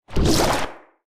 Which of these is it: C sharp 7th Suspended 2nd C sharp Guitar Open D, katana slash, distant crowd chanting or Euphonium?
katana slash